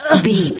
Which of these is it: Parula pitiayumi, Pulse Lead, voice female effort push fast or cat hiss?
voice female effort push fast